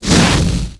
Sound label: fire boomerang; Pc Skill; FlyForInsanity